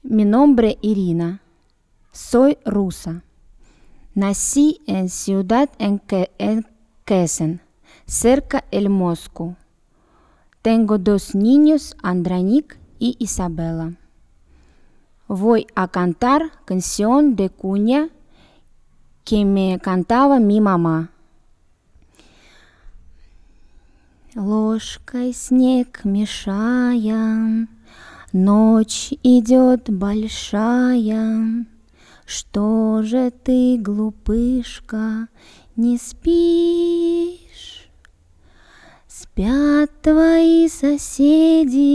canciones de cuna

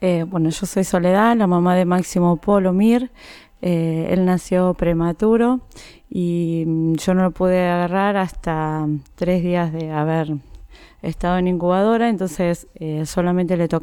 canciones de cuna

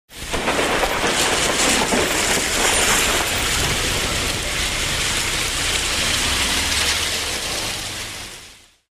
calles lluvia; cafferata y montevideo; Rosario

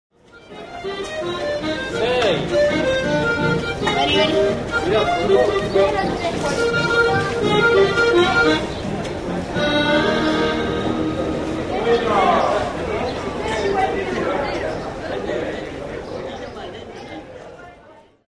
calles acordeonista musicos en la peatonal; san martin 850

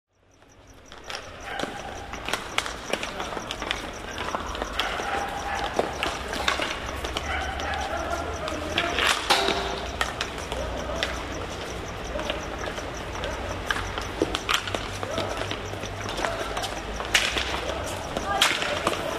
plazas adolescentes practican hockey; plazas del che; Rosario

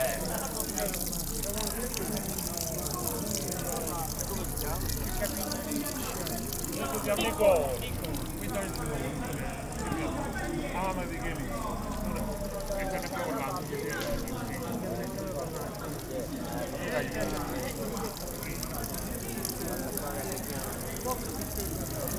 fontana; italia; campobasso; ripalimosani

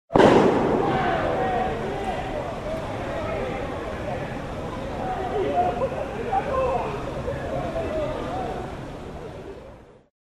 parques explota pelota futbol; parques nacional a la bandera; Rosario

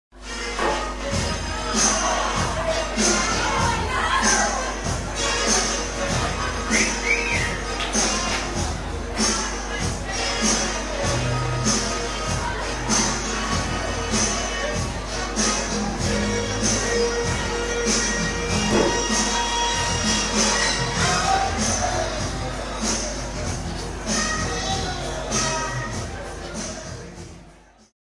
bares el sotano pos recital; mitre 785

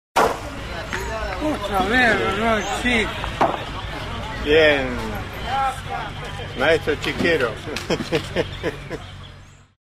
parques pers jugando bochas; urquiza

